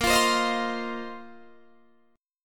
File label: A sharp Suspended 2nd Suspended 4th down Guitar Open D